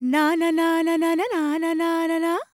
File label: World Voices, INDIA; ILIO; Vocal Planet